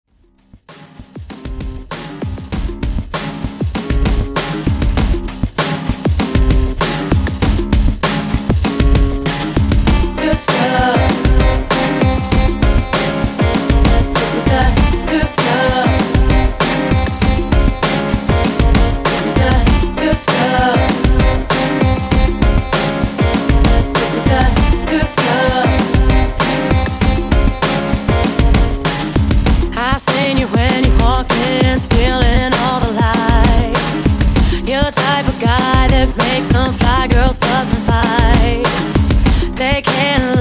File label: 90s RnB, 98 Bpm; ReDrum